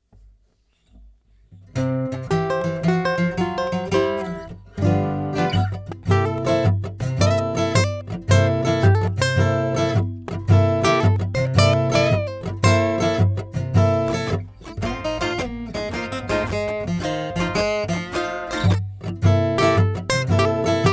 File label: ukulele guitar blues